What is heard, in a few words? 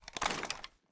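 weapon foley assault rifle grenade launcher raise movement; weapons; gmod; viper; scharlie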